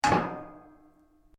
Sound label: METAL ON METAL; Metal (Sound Effects:Materials)